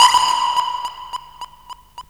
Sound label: NOISE ECHO; USB Soundscan vol.27